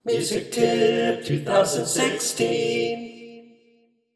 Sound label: Jingle; MusicTipp